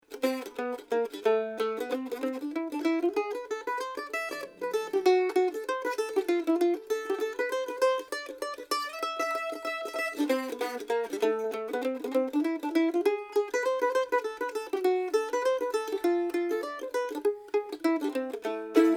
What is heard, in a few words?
Mandolin; Mandolin 2P DelDawg